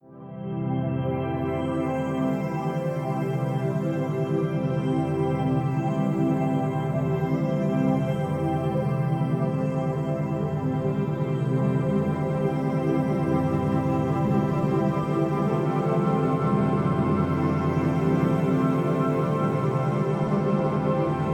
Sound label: Alpha Waves Uplifting